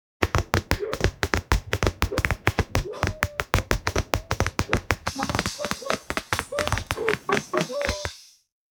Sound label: zzzzzz whoooproll